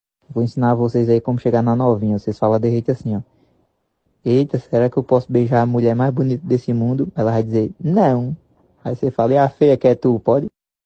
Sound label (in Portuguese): mulher feia cantada